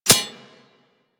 Arrow Impact Metal; Warframe; Weapons

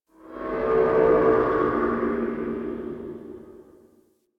interior cave tonal drones; Ambience; Misc Indoor; DE; Warframe; Infested Eris

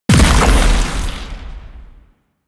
Octopede Oblivion Proj By Explosion; Warframe; Man In The Wall

